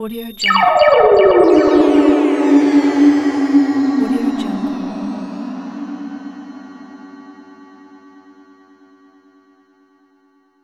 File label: scifi effect; wildemotion